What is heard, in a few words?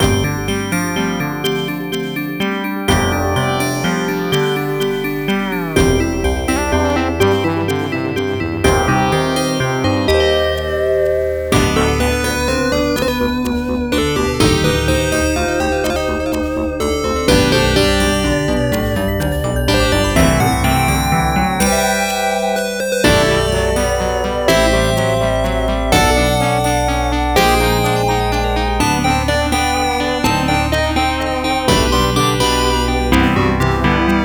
Storytelling